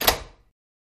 Dead Bolt Lock Click; lock & keys

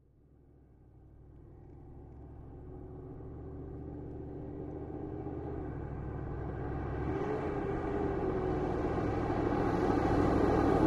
Humvee: Medium In, Idle And Away.

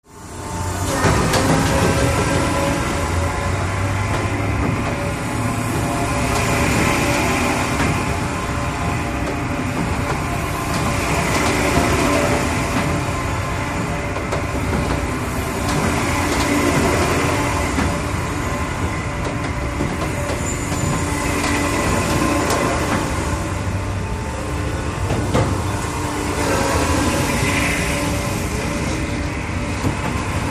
Ride Circular Hydrau, carnival ride

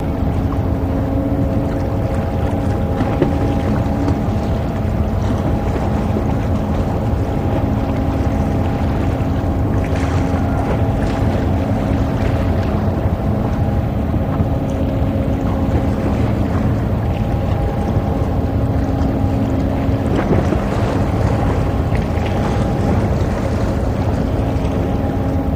Harbor Industrial, water lapps

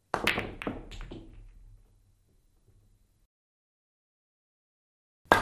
Billiard Ball Bankin

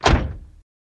Door Close Thud

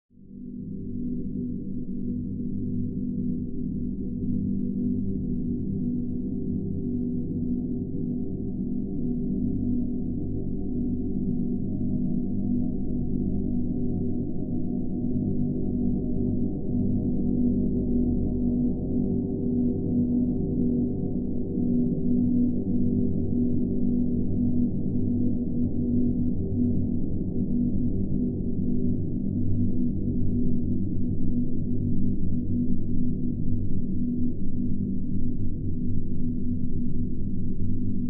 Bed Deep Space Drone

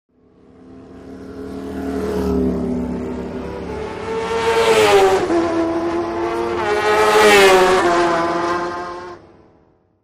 Motorcycle Road Racing

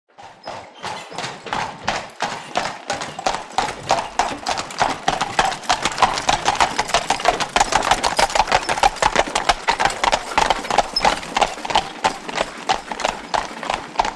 By Horses Circle Up Pa

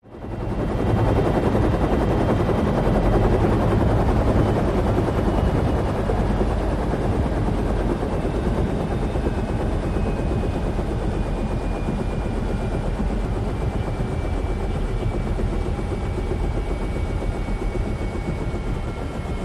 UH-1 Huey: Interior Idle And Power-down

